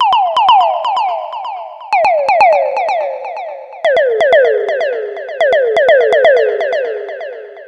DRUMLOOPS, Disco; Best Service ProSamples vol.48 - Disco Fever; AKAI

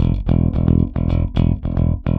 Rock, Bass; Bass X

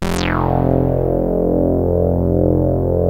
SAW RES WET, SYNTH; Keith Emerson Signature Series

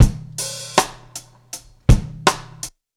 84bpm, Hip-Hop Street Beats; Hip-Hop Street Beats Construction Kit; Street Beats by Poogie Bell

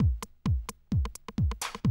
130, Classic DrumLoops

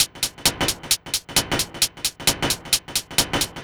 Techno Groove Hats, 132bpm; Zero-G; Soundclash; Combi Loops; Nu Dance Electro

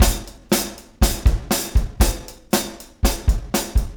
Room

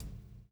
PULL; Spectrasonics - Backbeat

